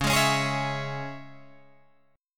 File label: C sharp Minor C sharp Guitar Drop D, chord; 11,11,11,13,14,12